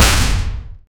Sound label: undead roar with heavy